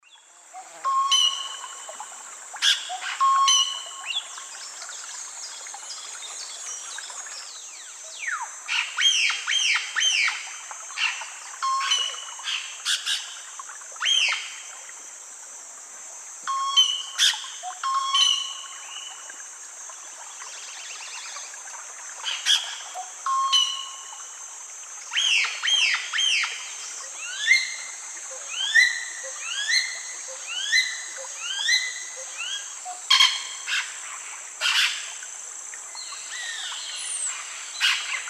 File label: Clypicterus oseryi, calls and song; S. America; Eastern Lowlands